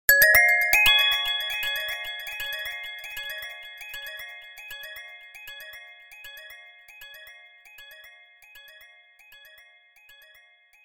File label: Echo Note